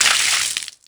hit blood, skater; Tony Hawk's Project 8; XBOX

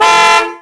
auto large car horn

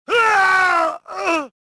choke; rebel

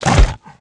Death, Jaldari Mutant, Aliens

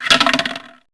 roulette start; Max Payne

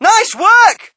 crowd, shout; Mike Tyson Heavyweight Boxing

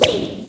frog attack; pond

